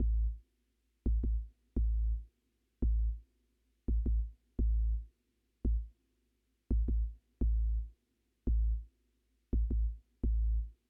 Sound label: Cinematic; Get Even